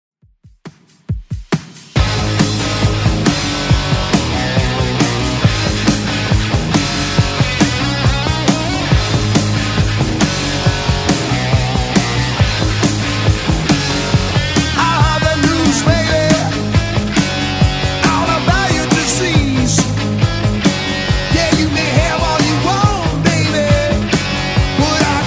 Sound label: 70s Rock 130bpm; Redrum